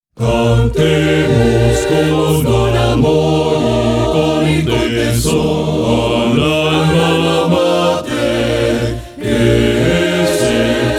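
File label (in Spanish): Himno de UPR Carolina Bass, Coro; Coro y Banda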